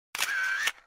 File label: camera capture shutter